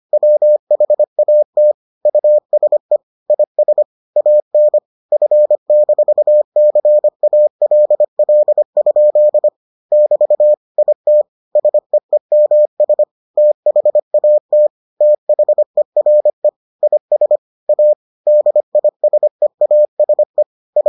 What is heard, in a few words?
morse